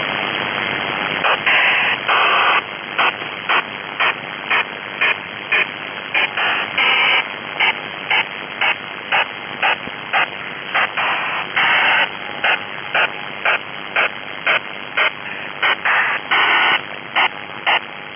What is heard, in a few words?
link-11 slew